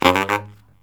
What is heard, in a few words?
Killer Brass Riffs